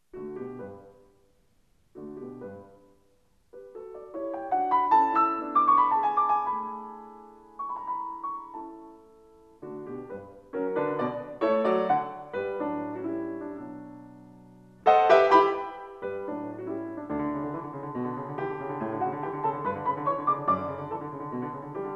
Piano Sonata No. 7 in D major; Wilhelm Kempff; Beethoven; Piano Sonatas

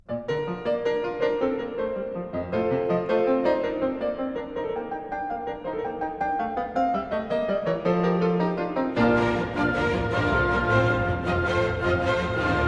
Piano Concerto No. 2 in B-Flat Major; Op. 19; Beethoven

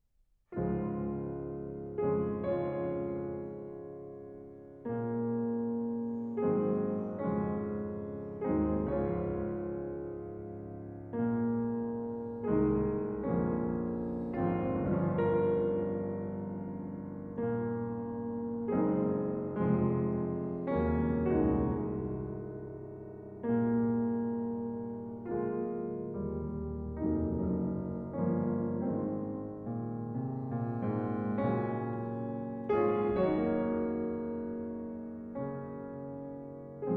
Preludes Op. 32 No. 13 in D-Flat Major

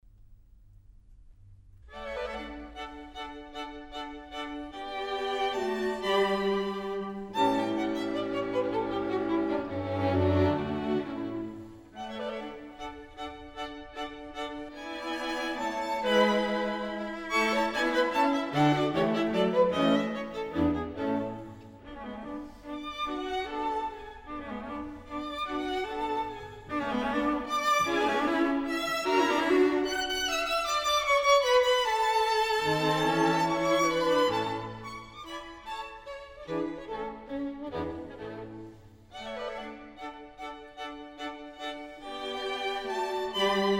String Quartet in D Menuett; Wolfgang Amadeus Mozart; Mozart String Quartets

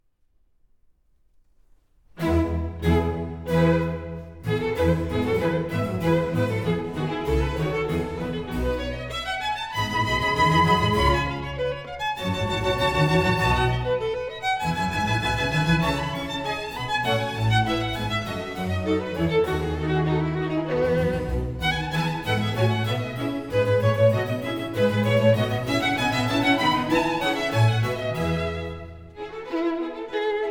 Violin Concerto No. 2 in E major

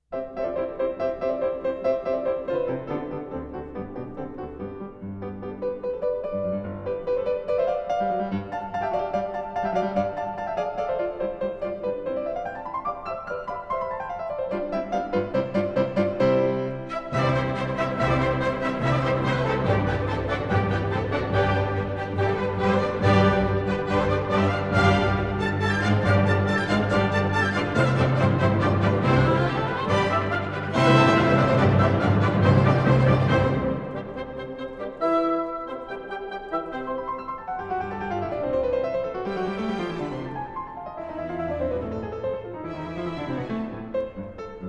Piano Concerto No. 1 in C Major, Rondo; Op. 15; III. Rondo. A; Beethoven

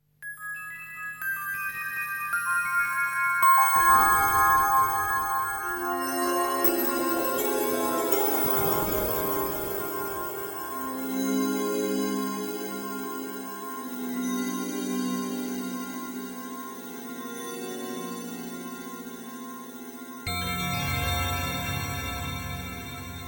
Bell Threshold; Cheltenham

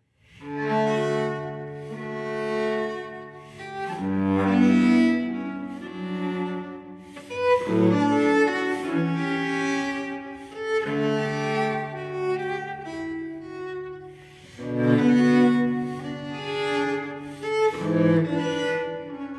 Cello Suite No. 6 in D major, Sarabande; BWV1012; Johann Sebastian Bach

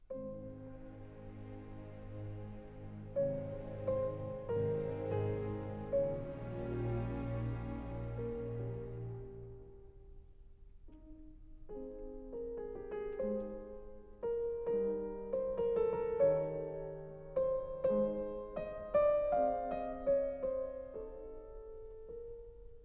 Piano Concerto No. 1 in C Major, Largo; Beethoven

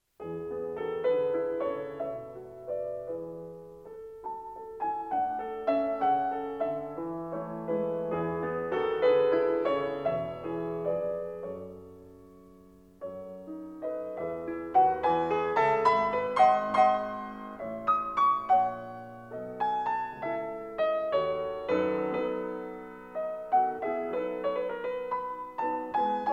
Piano Sonata No. 28 in A major; Wilhelm Kempff; Beethoven; Piano Sonatas

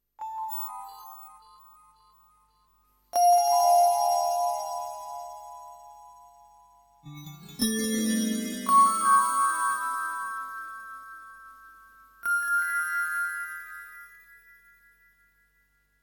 Sound Check Preamble; StPauls

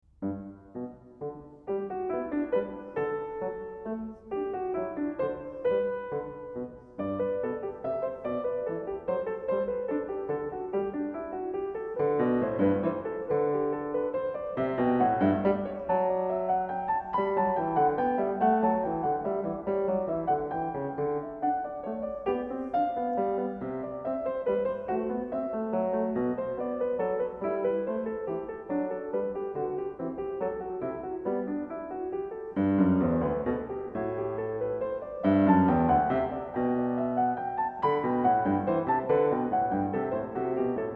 Duet in G major; Bach - The Art of Fugue; Bach, J S

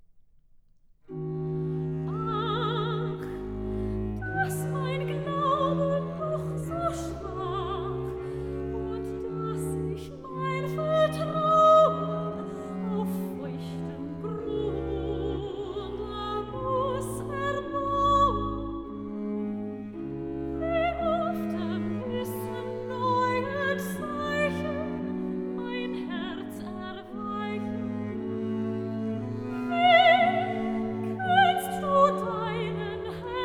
Cantata Recitativo; Bach; J S Bach; Herreweghe; Johann Sebastian Bach; BWV38 Aus tiefer Not schrei ich zu dir

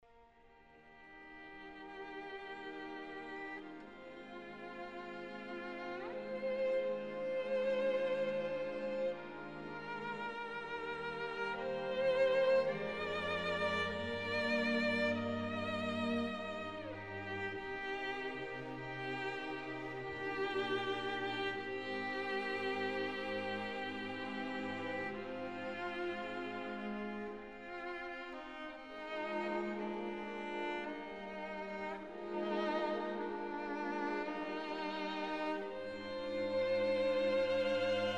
Violin Concerto

